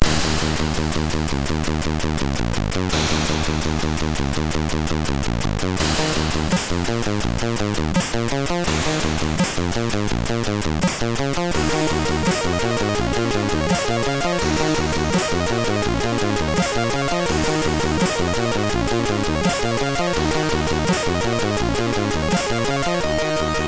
Sound Demo, sid MOS6581R2

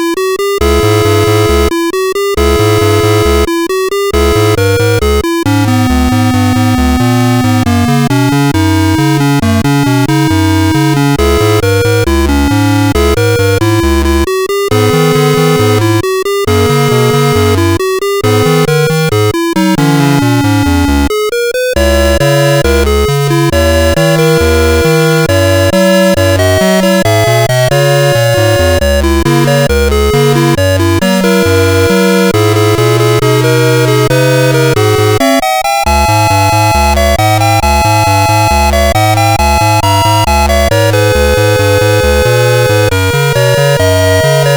Cotton Mill Blues, CSG8580R5

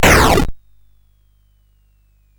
Frantic, CSG8580R5

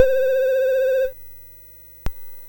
Mini Golf, MOS6581R4

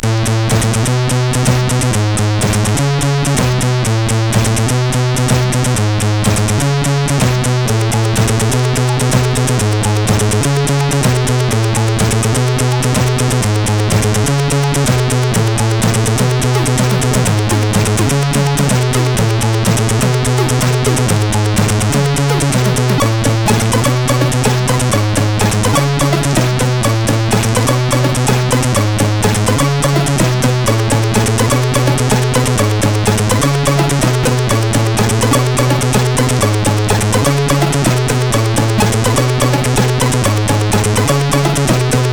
Music, CSG8580R5; By TSM

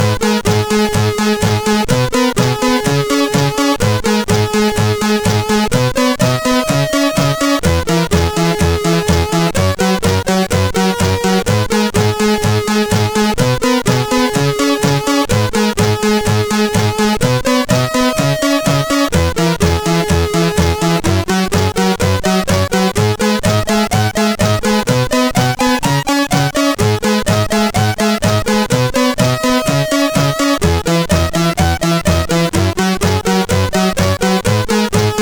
Disco, CSG8580R5

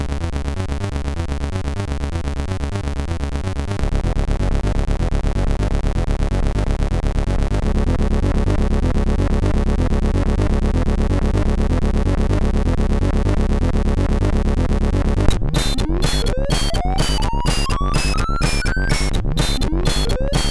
Hard Music, sid MOS6581R2; Warrior

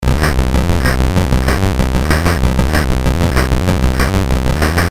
MOS6581R3, GAMES; Ufon Lahtaus BASIC; HVSC

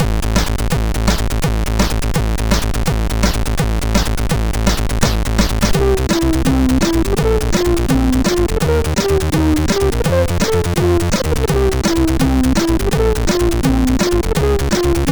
sid, MOS6581R4

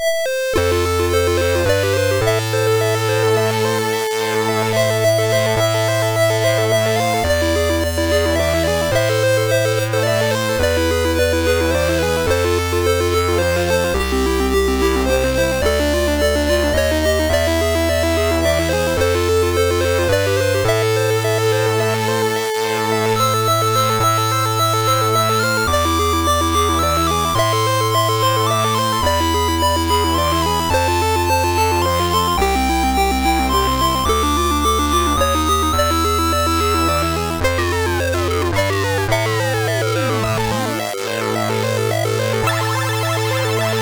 Slow and Sad Intro sid MOS6581R3

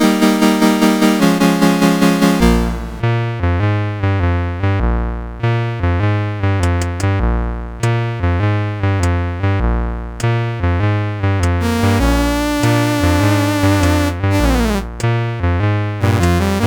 Night Train, CSG8580R5; Roger Ambill

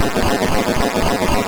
Boing

